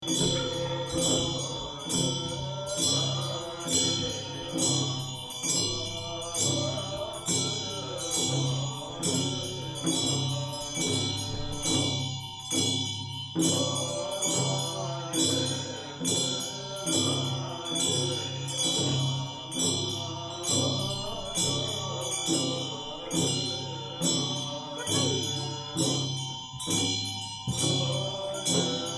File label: puja in Koto, nepal